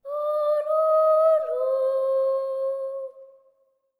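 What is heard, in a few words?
BOY phrase, Symphony of Voices; Spectrasonics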